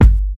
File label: modern strong bottom end kick drum g key